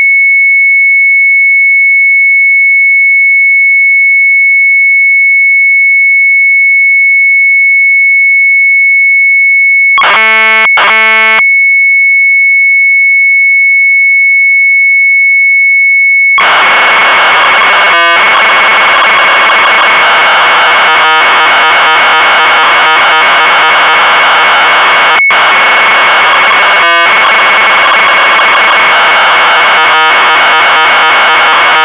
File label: Sharp MZ800 Tape; WERELDKLOK; retromelsarchive